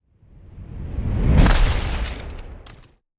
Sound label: noise buildup + shotgun; 81.5bpm; reaper shotgun; Pony Fan Music Remix Stems